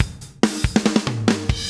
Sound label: 140 Groovy Fast Drums; Pop Rock